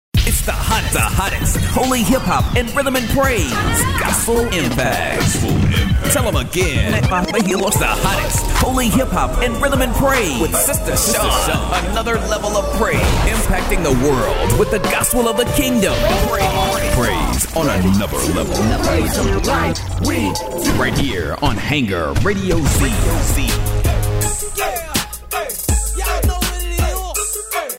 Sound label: Gospel Impact